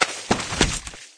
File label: drink splatter pop; There; ThereClient